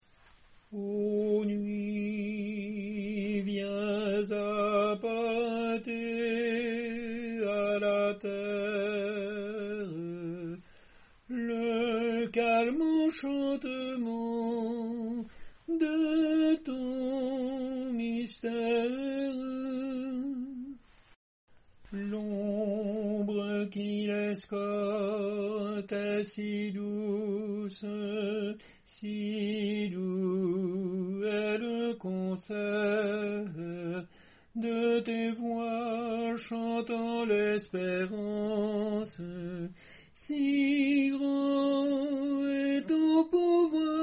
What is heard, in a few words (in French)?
la nuit tenor